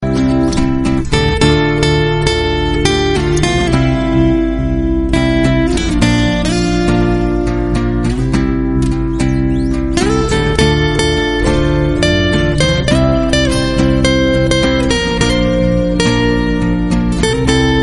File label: romantic guitar music tone; iphone ringtones